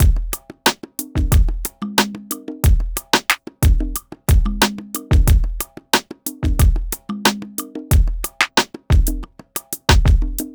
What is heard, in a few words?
Urban Beat, Drums